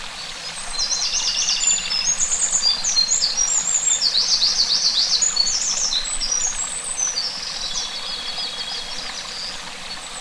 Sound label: small birds